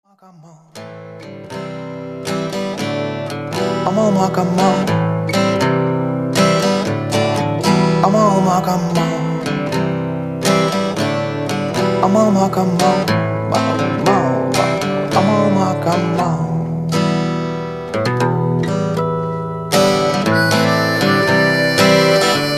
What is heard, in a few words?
acoustic; DEMO